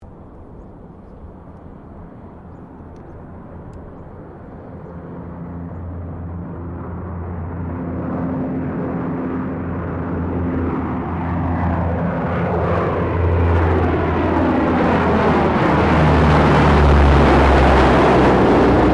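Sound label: Airoplane C130 Hercules Pass Overhead, Airshow; Avalon Airshow 2005; Airplanes (Sound Effects:Vehicles)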